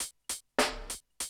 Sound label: drum loop, 100 BPM